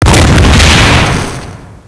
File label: ship impact; cerberus